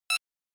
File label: SNES Shooter Select